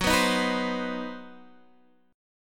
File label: C Minor Major 7th Flat 5th Gb, chord